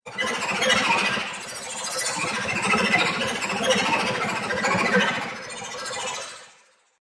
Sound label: Robotic Alien Chatter; Robot Sound Effects